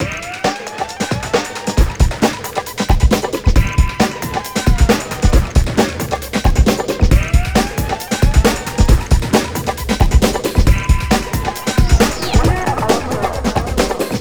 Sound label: Ditty; NBA Inside Drive 2003; Xbox